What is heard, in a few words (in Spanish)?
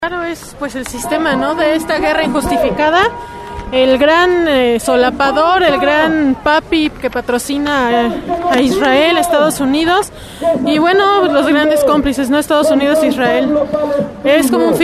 marcha; palestina julio 2014